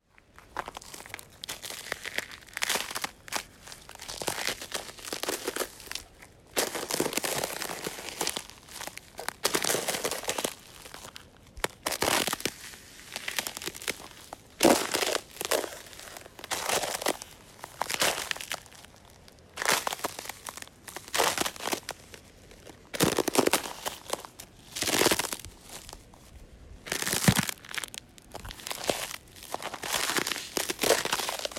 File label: cracking ice over a frozen puddle; Field Recordings